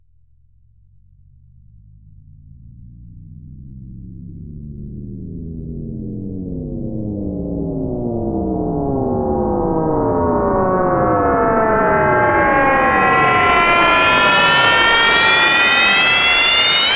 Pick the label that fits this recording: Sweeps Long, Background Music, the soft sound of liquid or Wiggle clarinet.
Sweeps Long